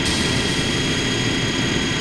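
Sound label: factory; Splashdown; XBOX; Soultree; Threeriv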